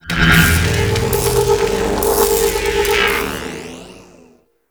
Idle Agile Power; Volt

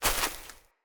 Grunt Step Veg, footsteps; Enemy; Corrupted Sproutfolk

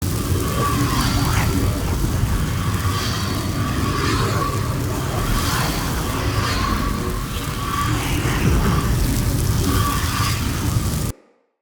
Laser Rune Beam Loop; Runes

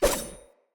Throwing Knives Throw

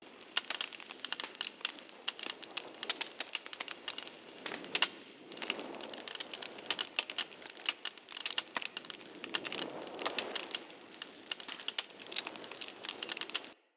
Fire Hazard Burn Pile Medium Loop B; Undercity

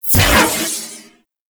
Proj Fully Charged Whoosh; Weapons; Warframe; TheNewWar; Omicrus

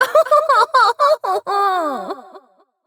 Laughter; Battle Barks; Entrati Doppelganger; Evil Twin C; Warframe

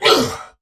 Pain; Warframe; Dax Knight; Battle Barks

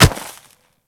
Kubrow Step Snow; Warframe

